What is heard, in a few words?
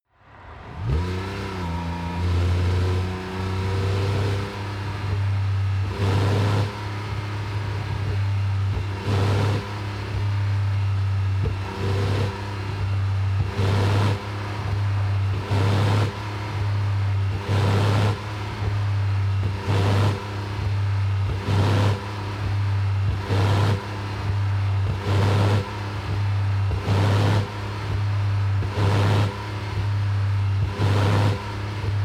sports car int engine short thrusts sequence rpm low; verkehr transport; autos